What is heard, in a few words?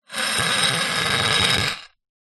household garden trowel scrape on concrete